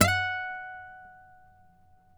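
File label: nylon, FLAMENCO, Guitars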